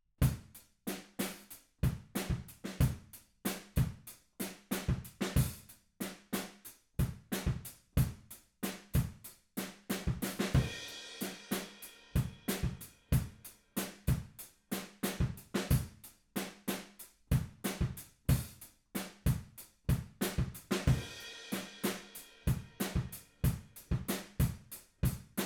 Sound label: Room